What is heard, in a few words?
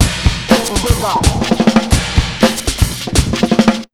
beat, Electro 125, Big Beat Drumloops; USB Soundscan vol.25; AKAI